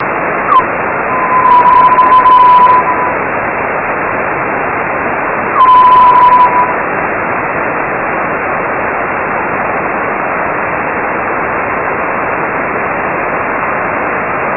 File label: geminide 3 pings navspasur